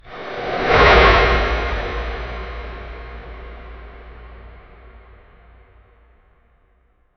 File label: shofar blown, religious